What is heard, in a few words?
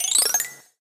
key; Dino and Aliens